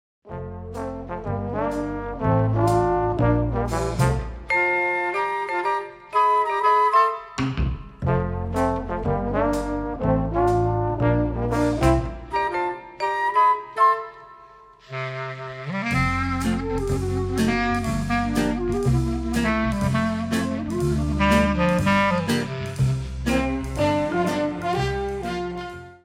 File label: Orchestra